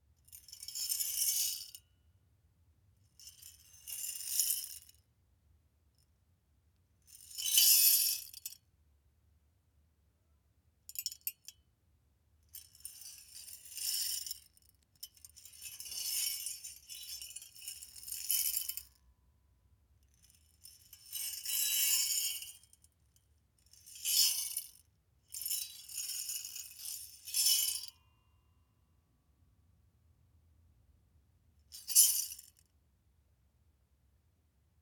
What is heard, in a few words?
Long Metal